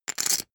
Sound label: poker chip stack rattle